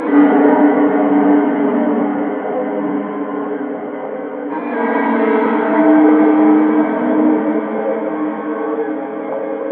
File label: Industrial Metal Reverb; de alliance